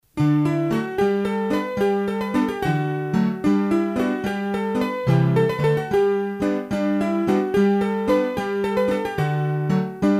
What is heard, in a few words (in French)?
Bourree a Dechaux, hurdy gurdy; trad; Bourrees a 3 Temp